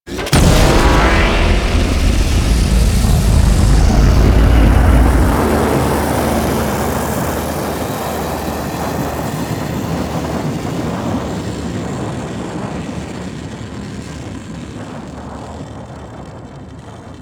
Capital Ship Mission Fail Exit; Warframe; Corpus

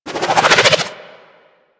SMG Alt Projectile Deploy; Weapons; Warframe; Tenno; Sundial

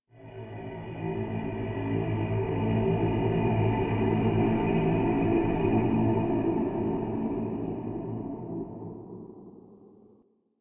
Rand Chant; Warframe; DE; Hubs; Syndicate Arbiters; Tenno

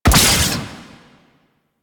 Crack Impact Slash; Warframe; Warframes; Khora

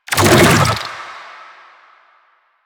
Infested Move Start; Warframe

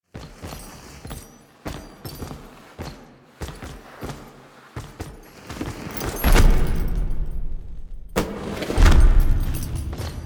Courtyard Intro Foley Steps Fall; Cinematics; Dax; Drifter; Warframe; Duviri Quest

